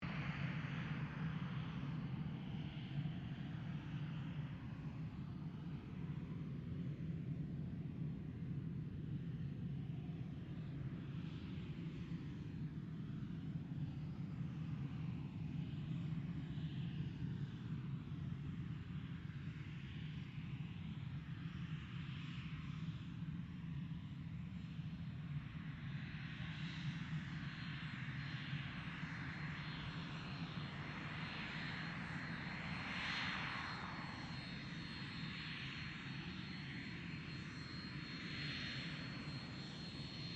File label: AIRCRAFT CESSNA A-37B DRAGONFLY TAXI DISTANT; Dragonfly Jet Fighter /Bomber; Airplanes; Sound Effects:Vehicles